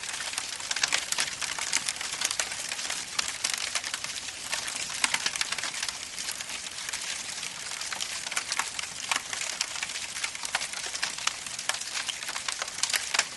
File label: camp fire burning